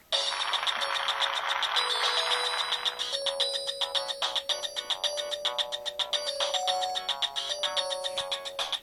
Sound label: samsung sghe700 ringtone